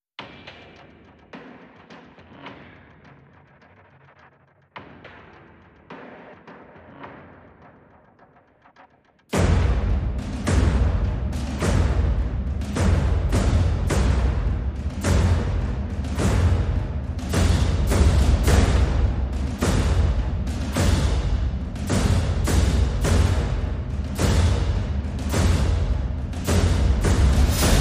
perc only

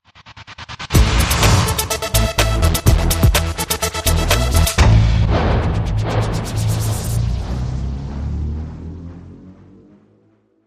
Electro Cute Ramp No Electrics

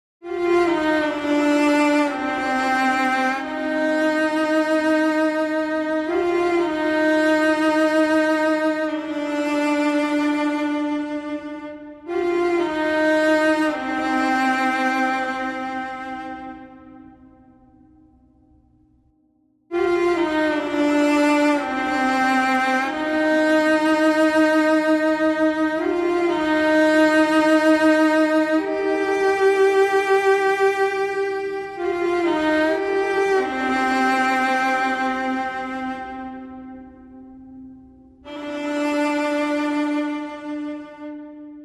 Viola Only

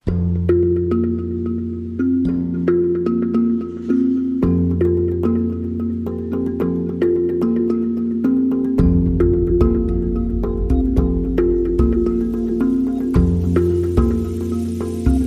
Hang Drum Jam

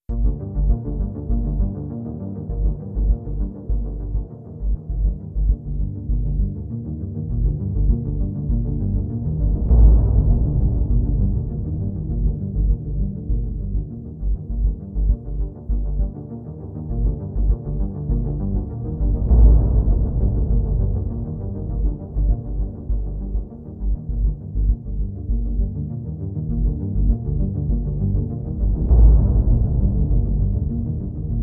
Drums and Bass

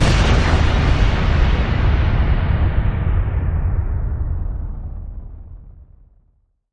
Big Boomer Impact

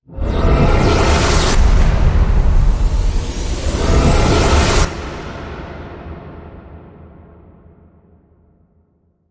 Rise Short

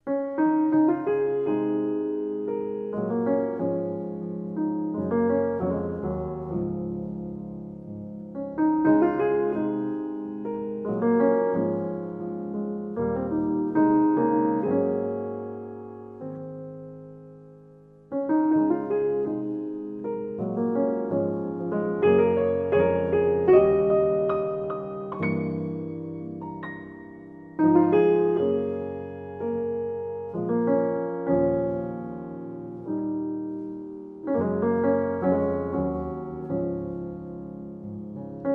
Solo Piano

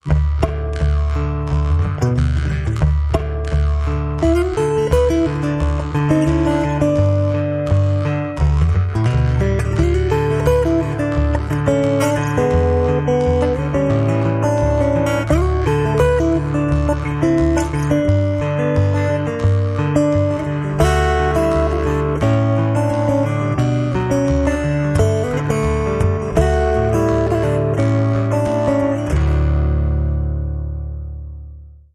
Grampas Old Guitar

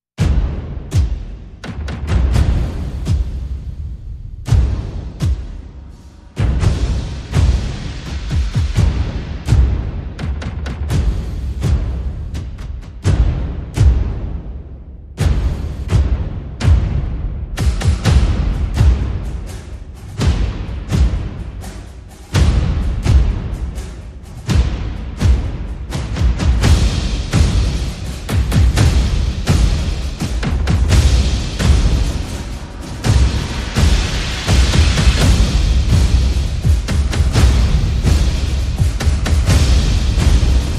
Perc and FX

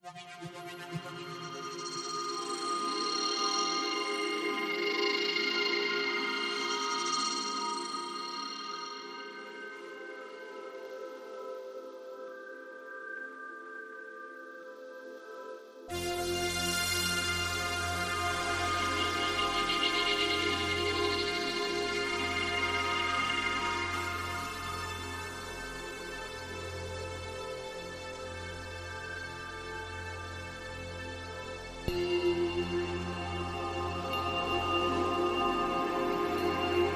Oratorio Drone Light

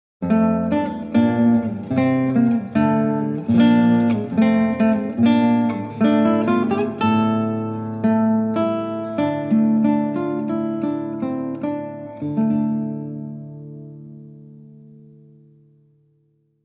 Solo Guitar

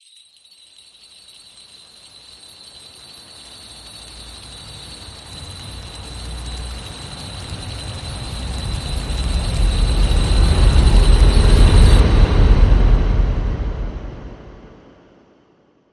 Jingle Jingle Rise

